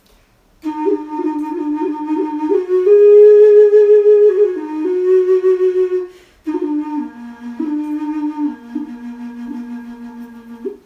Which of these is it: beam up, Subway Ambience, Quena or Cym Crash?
Quena